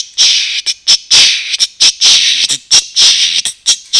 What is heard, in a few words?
cymbal